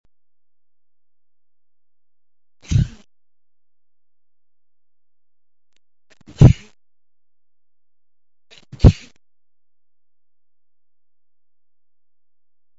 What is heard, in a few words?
sneezy, male